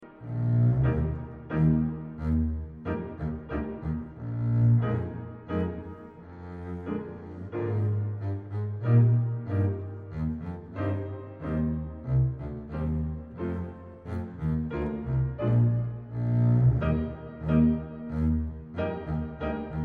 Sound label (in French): exemple de staccato; l elephant sautille